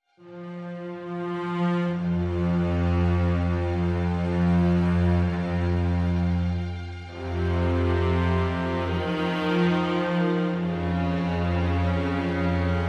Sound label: Strings Only